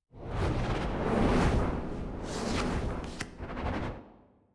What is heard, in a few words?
Heroes Intro; arcane